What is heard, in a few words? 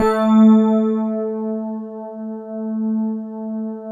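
GLASS Rhodes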